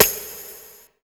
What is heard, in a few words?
SD FX, Hip Hop R n B; USB Soundscan vol.19; AKAI